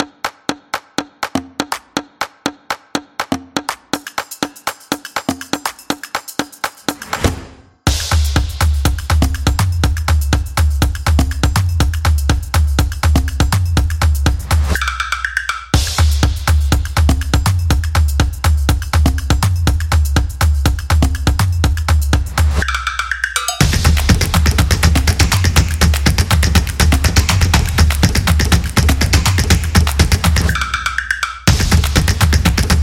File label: Percussion